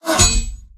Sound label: tank hit small interior; tanks; War Thunder